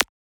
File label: Transient; FLPRO